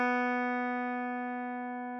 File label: B3 246.94Hz 8 string; tuner